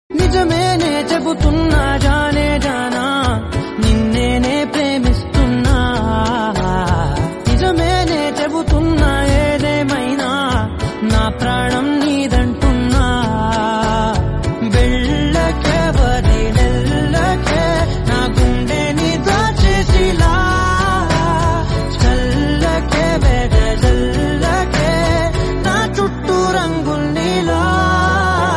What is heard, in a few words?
Telugu Ringtones